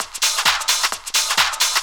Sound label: drum 130, techno trance drumloops